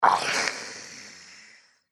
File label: Orc Hissy Snarl; Monster Sound Effects; horror sound effects